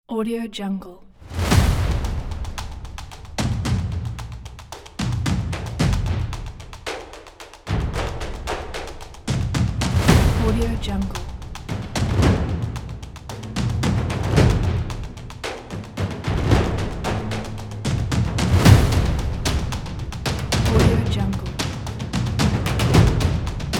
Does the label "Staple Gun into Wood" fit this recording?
No